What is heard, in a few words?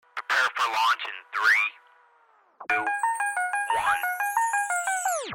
Slow Jams